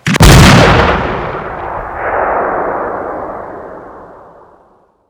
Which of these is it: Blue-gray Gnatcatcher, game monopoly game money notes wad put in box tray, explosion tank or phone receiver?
explosion tank